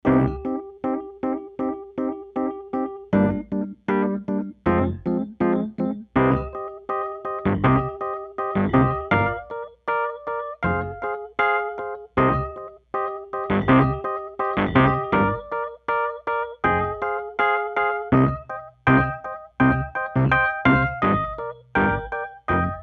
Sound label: Rhodes